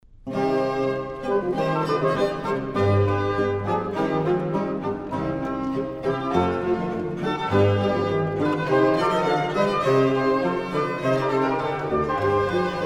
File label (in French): Concert pour quatre parties de violes, Gigue francoi; Charpentier; H. 545